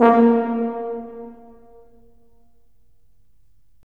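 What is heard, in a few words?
brass tuba; LA Composer vol. 3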